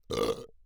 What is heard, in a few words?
burp; OPENAIR; Anechoic